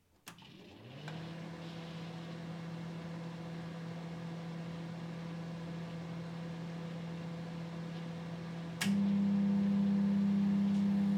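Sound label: mikrovolnovka lg, microwave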